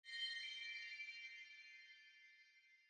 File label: jingle